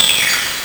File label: TR727, Nintendo Gameboy LSDJ; 90 sSampleCDs; 300 Drum Machines